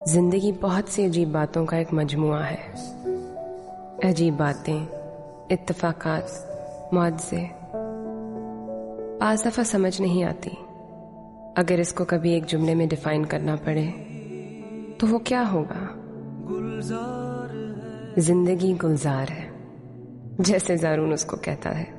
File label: background music